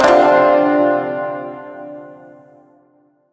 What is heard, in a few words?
Shawzin Maj Chord D; Warframe